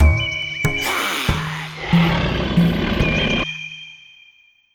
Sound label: basic Mood Bosque